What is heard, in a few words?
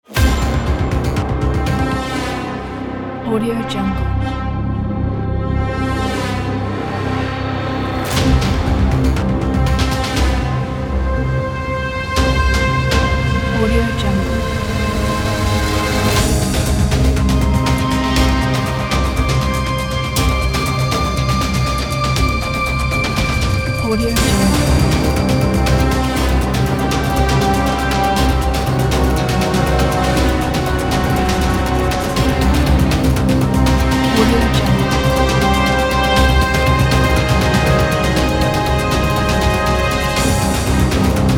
intense cinematic action trailer